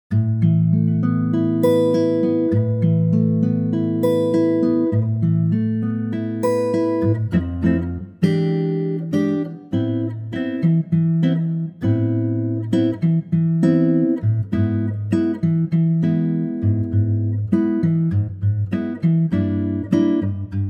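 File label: Acoustic guitar Am, male; key - Am - vocal range - B to D; Intimate guitar only arrangement of this wonderful 60's Bossa Nova gem.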